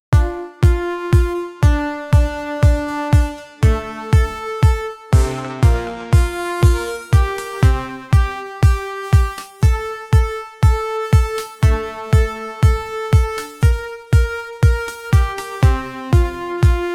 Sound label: a simple tune that fits, background music